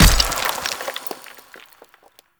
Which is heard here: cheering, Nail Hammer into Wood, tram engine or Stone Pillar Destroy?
Stone Pillar Destroy